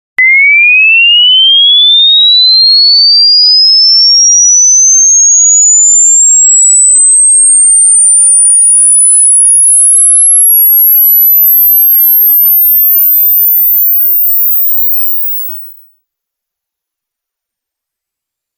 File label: 2 Khz - 20 Khz